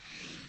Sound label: Stirkethrough; Phasmophobia